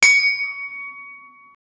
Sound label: realistic medieval blacksmith